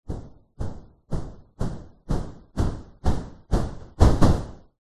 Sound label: marching; c-evo